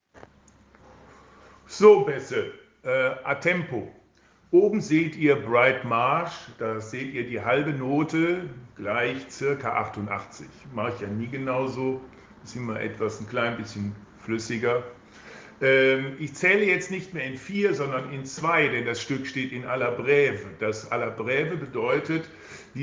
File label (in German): BN Bass atempo